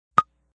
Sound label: Beep; Beeps; multimedia